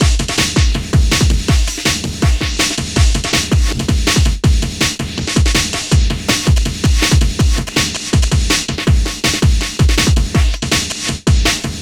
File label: Drum Bass, Electronica; Sonic Foundry; Electronic Point Blank; Drums & Percussion